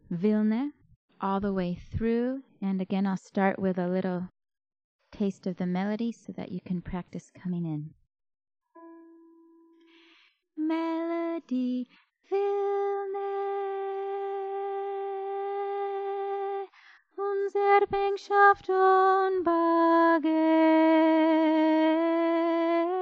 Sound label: Soprano; Vilne